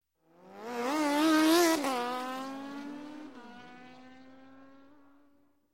Motorcycle, Race, Drag Single Bike Race By; Sound Ideas; Series 2000

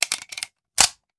Machine Gun Foley; Sound Ideas; Guns

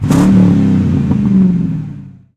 Viper GTS Rev; Automobiles

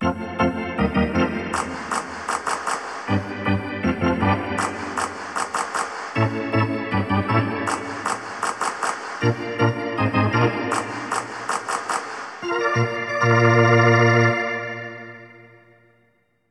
Hockey Organ

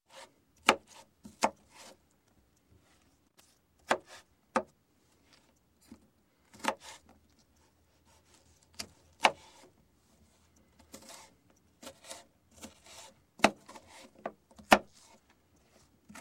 Cutting, Knife Slicing Vegetables, Food; Sound Ideas 2000 Series

